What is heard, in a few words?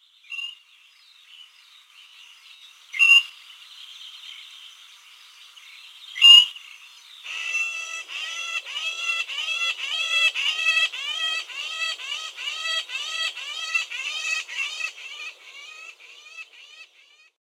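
seryy sorokoput golos, great grey shrike voice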